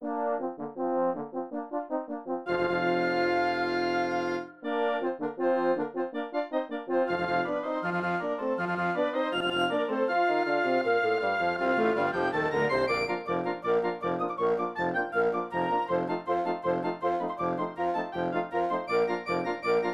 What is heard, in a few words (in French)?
Clochettes, midi